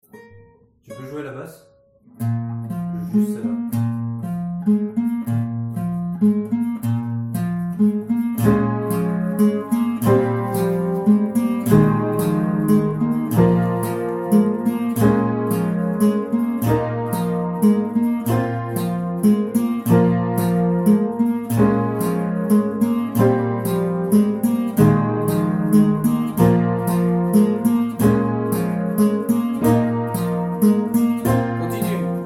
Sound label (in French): nappes bois sur basse; Courcelles Sur Aujon